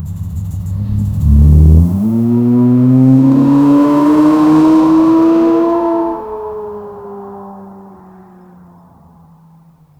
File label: a realistic sports car do